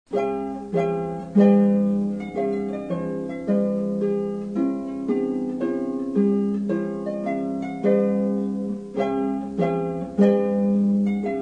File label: trumpet tune; purcell